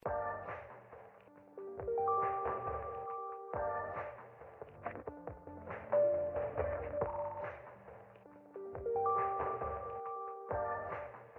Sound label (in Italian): brano muffled